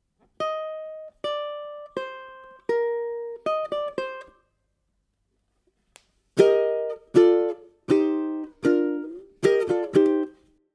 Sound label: Purple Turnaround, ukulele